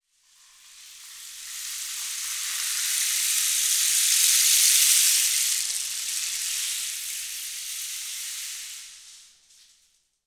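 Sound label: rain stick; Spectrasonics; Heart of Africa 1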